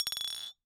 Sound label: Bottle Struck